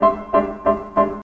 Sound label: 097 four piano strikes; KEYS ORGAN PIANO LOOPS